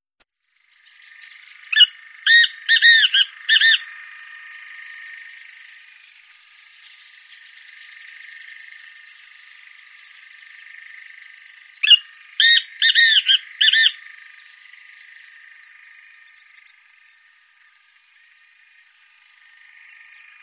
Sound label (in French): chant